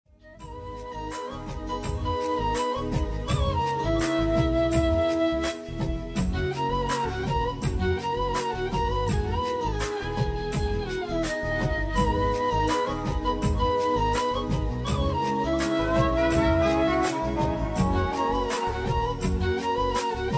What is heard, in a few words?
instrumental cover song